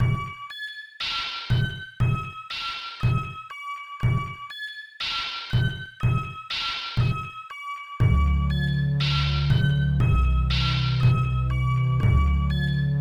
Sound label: sinewave